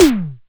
E Tom, DIGITAL KIT; Roland MV8800

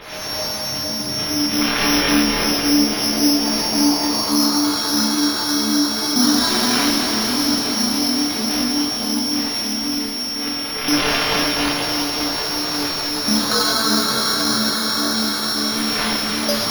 Spectral Tones

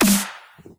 Hello Snare

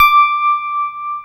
PIA DX E-PIANOS

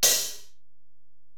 HiHat, Alesis DM-PRO

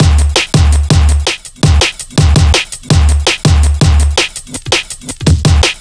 Drum Bass Drumloops 165bpm; Zero-G; Total Drum Bass

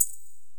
Korg x5, Drums

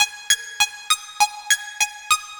Melody, IDM

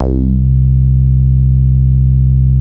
Bass Synths hits and octaves; FM 57 - The Ultimate Sample Collection (1997)

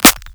jewel snare

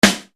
SNR PUNKTUAL, Yamaha RY-30

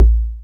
Analogue, Alesis D4 drum machine

